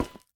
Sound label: Footstep Solar Panel